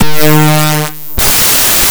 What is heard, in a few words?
drm 125, drumloops; house; PS-28 Vocal House Samples; stompdaperc